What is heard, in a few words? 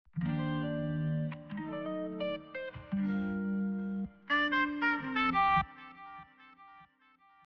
guitar melody and sax